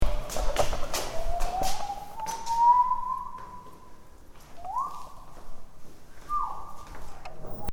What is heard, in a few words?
stairs whistle; Whistling in the stairway of Bibbins, I am interested in the sub-bass tones encoded by its concrete in space